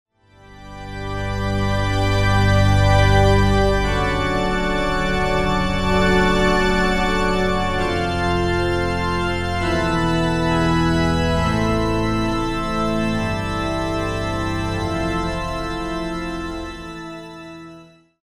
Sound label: Pipe Organ, Roland SR-JV80-01 Pop